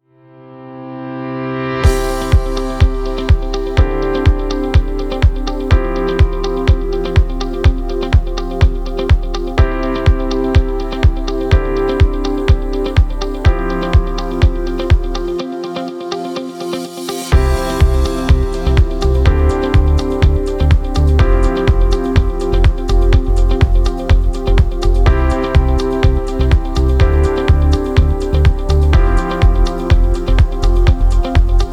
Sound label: Motivate Corporate